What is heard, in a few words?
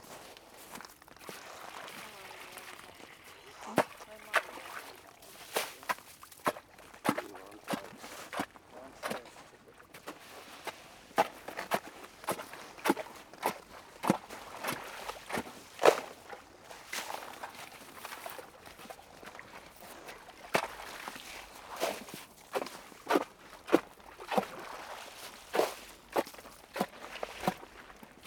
Chiseling Ice hole, Ice Fishing; The Ways - Ice Fishing; Nat Sound Selects